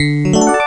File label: money; Microshaft Winblows 98; Parroty Interactive